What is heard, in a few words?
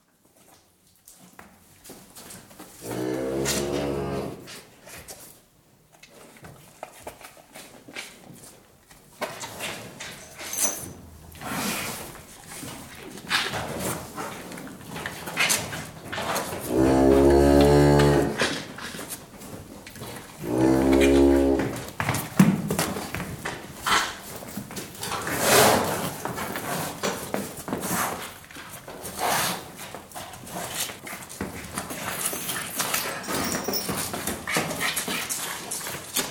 Calves, interior, suckling cow in barn (live acoustic, close perspective recording), lots of movement by calves; Cow Sound Effects; BBC